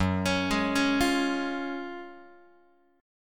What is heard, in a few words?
Gb Major 7th Suspended 2nd, Guitar; GbM7sus2 arpeggiated; JGuitar